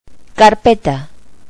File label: ring binder, office